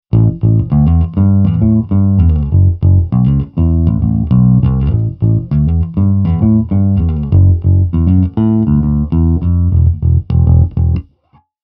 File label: Fender 60s JB